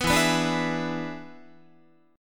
E 7th E down Guitar; E7 strummed; JGuitar

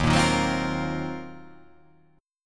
D sharp Minor 6th Add 9th D sharp, chord

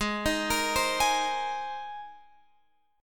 G sharp Major Add 9th, Guitar Open F; G#add9 Chord

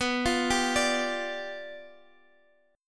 G 7th B

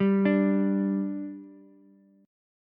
G 5th G Ukulele, chord; G5 arpeggiated

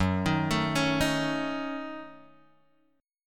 F sharp Minor 6th, Guitar Drop D; chordshape; down

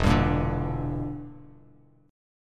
E Minor 7th Flat 5th E down Bass Standard; strummed

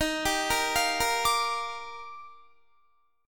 Eb Major 9th, Guitar; EbM9 arpeggiated; 22,22,22,22,22,22; JGuitar

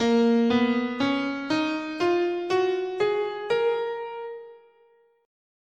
Bb Phrygian

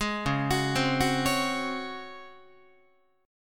C sharp Major 7th Suspended 4th C sharp Guitar; C#M7sus4; arpeggiated

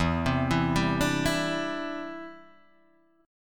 E Minor 7th Sharp 5th E, Guitar Half Step Down

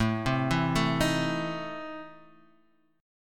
E Major 7th Suspended 4th Sharp 5th, A

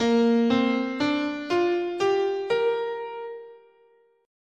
Bb Major Pentatonic, scale

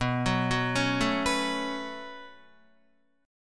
B 7th Suspended 2nd, down, Guitar; B7sus2; arpeggiated; JGuitar

